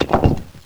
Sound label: Brick